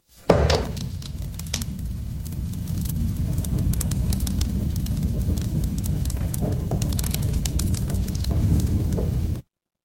ambience fireplace

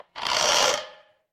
door creak

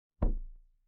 impact wood knock

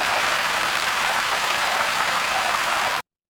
water stream

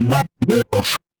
retro game text blip

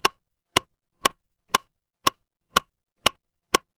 instrument wood block

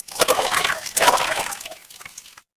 water splash

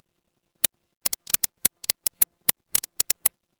office pen

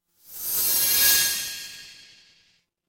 magic scifi portal

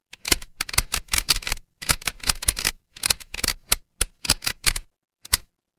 mechanical gear

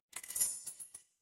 office cash register

